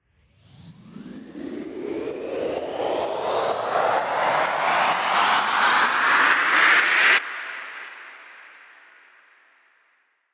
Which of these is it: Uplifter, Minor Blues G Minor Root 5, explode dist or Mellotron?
Uplifter